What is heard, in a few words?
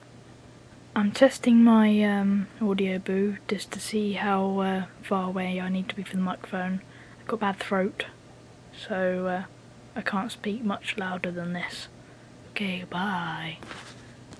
sorethroat boohoo